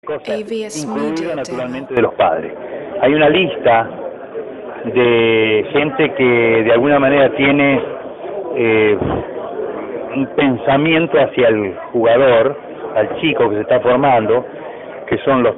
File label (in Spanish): grita